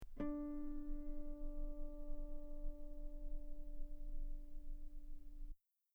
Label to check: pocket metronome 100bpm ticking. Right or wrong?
Wrong